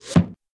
reel Bounce; scruffy duck

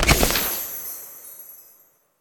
win symbol flash end; fairyred

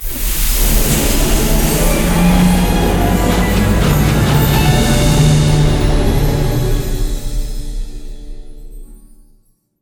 random Wild Start; fairyred

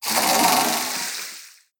chocolate wheel start; koi princess

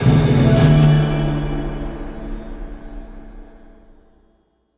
random Symbol Transform Loop End; fairyred

